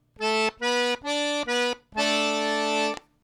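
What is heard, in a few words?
right hand licks, Phrase six; Back Door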